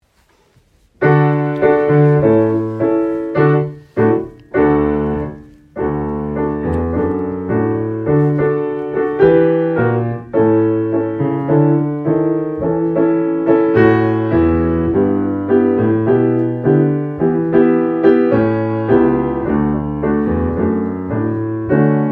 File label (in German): Klavier